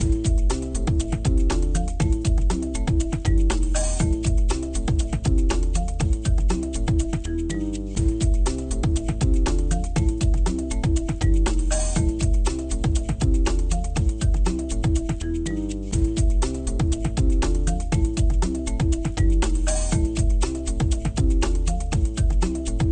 Rock Island

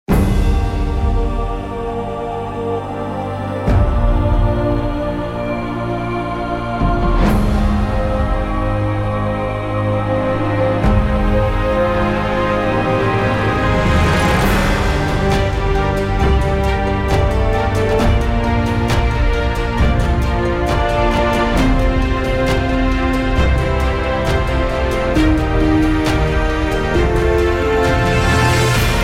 Battle BGM Fantasy; Neoverse

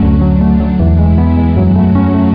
Loading Music

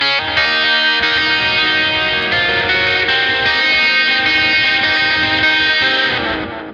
Lake Hi Gtr E flat 9

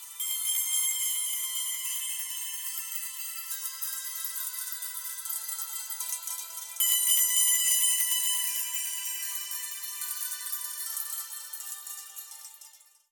Forest Dulcimer; torchlight iii; ps4

